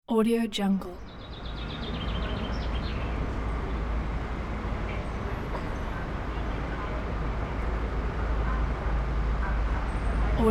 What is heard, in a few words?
city street ambience